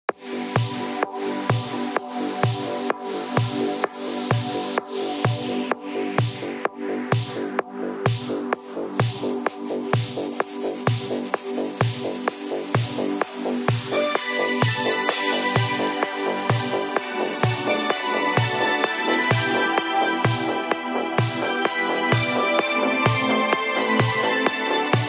80s Mix, wave synth pop

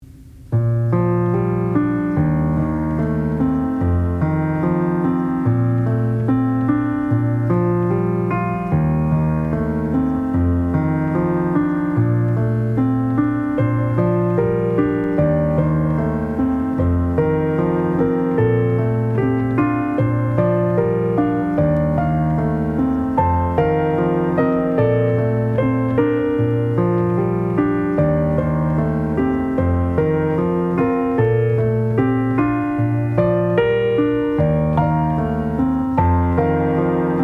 Disklavier Flute and Strings

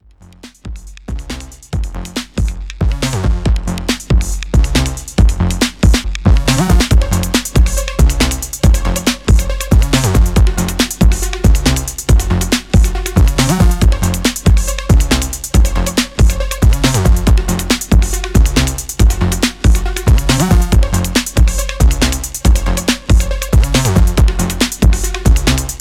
Glissando

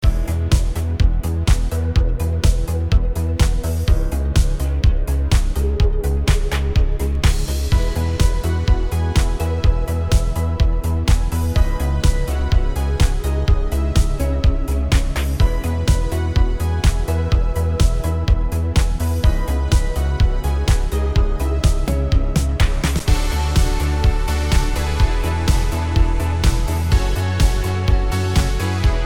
instrumental

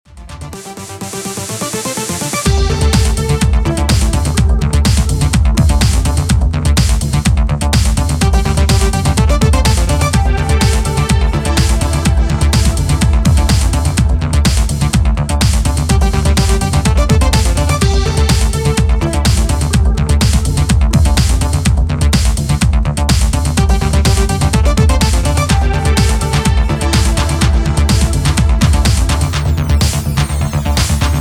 Instrumental

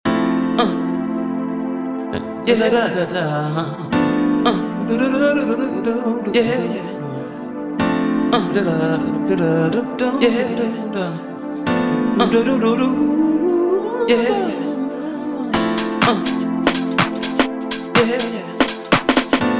Bass Groove

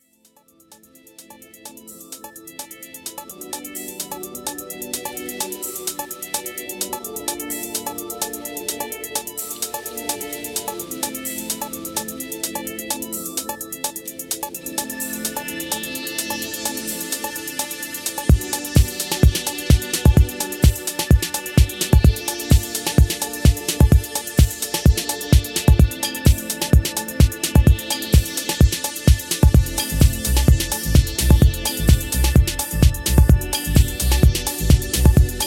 Mystical Ambience

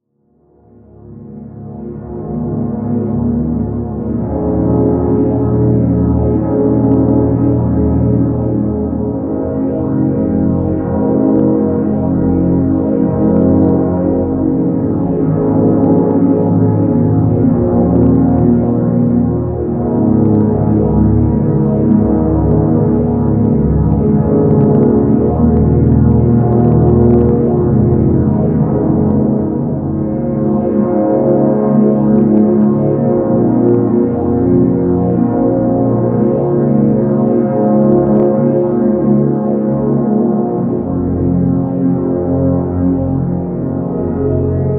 Ambient Track

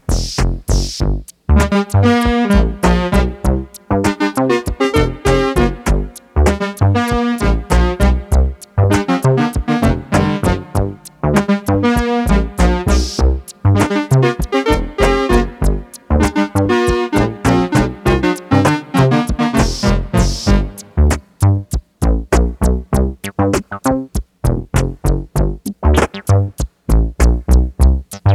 Dub

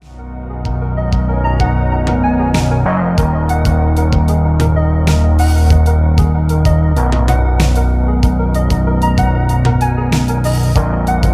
SYNTH ALIEN

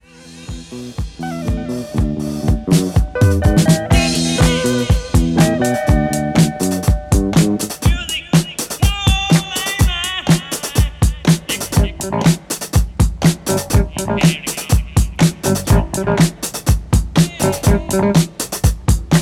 drums

